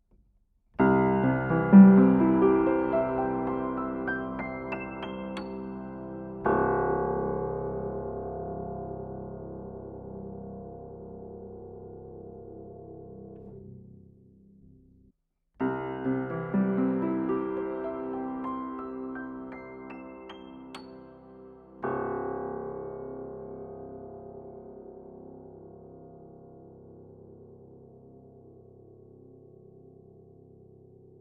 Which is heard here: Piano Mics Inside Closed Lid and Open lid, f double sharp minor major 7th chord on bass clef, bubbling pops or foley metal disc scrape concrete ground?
Piano Mics Inside Closed Lid and Open lid